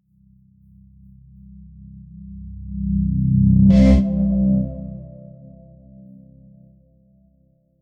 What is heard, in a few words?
cinematic startup tone